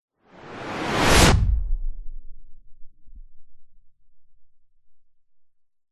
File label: whoosh white noise bright into slow mo tail; sound design; zapsplat